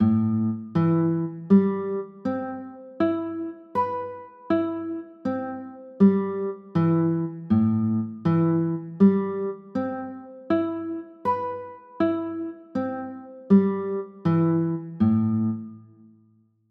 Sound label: a m9, chords